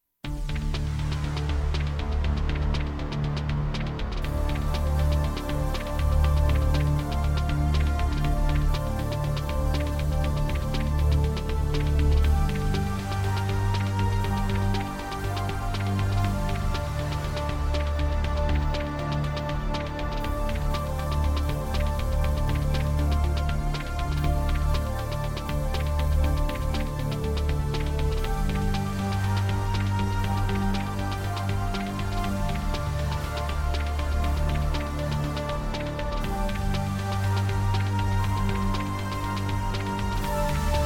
Sci Fi Open

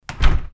Door Close Interior

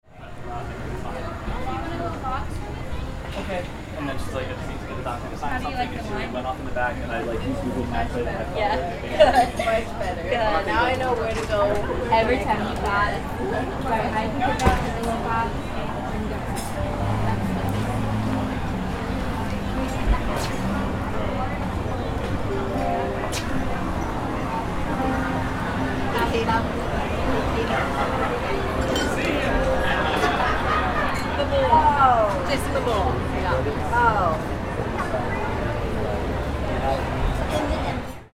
Street Ambience

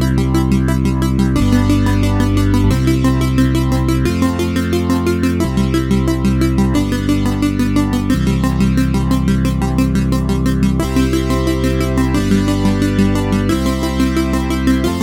Classy 8 Bit, looping music

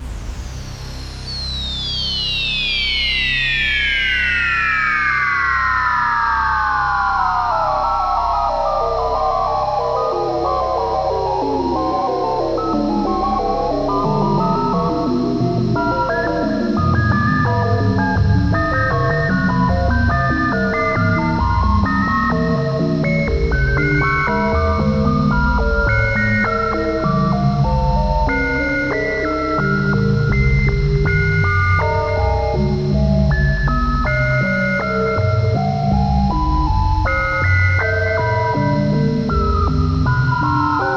Information Shutdown Looping; sci-fi

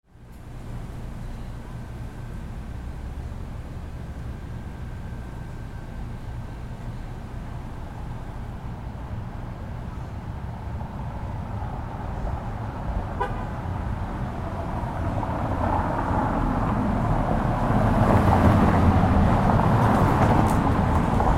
Street Ambience

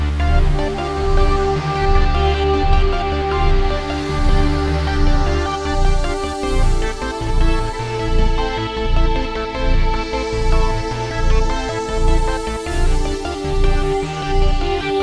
Grunge Bot; sci-fi

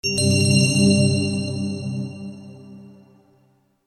Bells

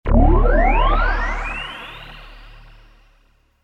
Power Up

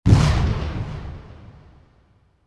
Explosion

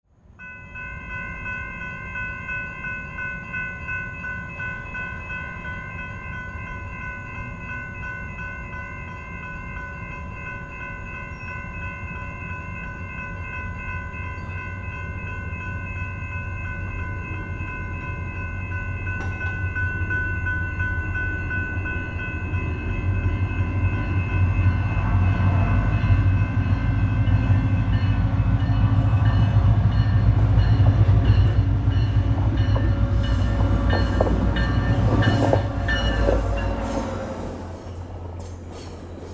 Light Rail Train

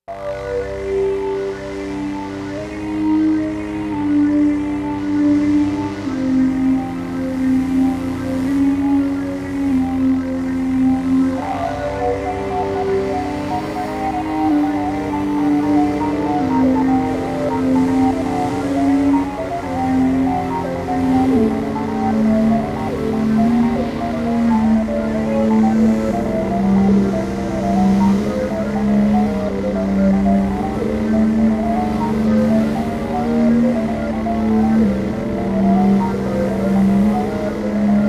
Crash Landing

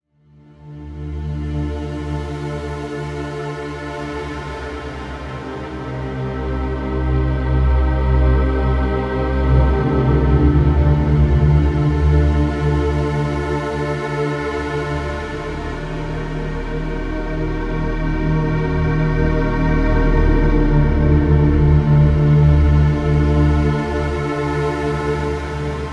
Horror Game Menu Looping, fantasy

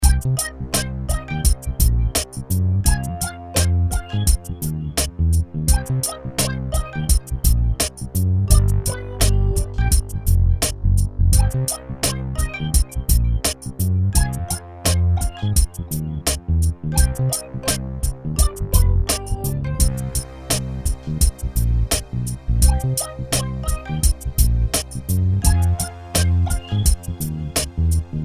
Bumbling Burglars Looping

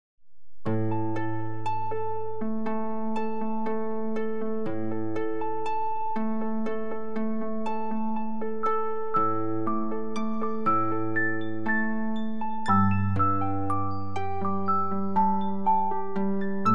Gentle Closure; Fantasy Music

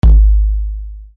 UI Quirky

